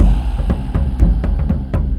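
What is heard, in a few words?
Music Shield; From Dust; PS3; Xbox 360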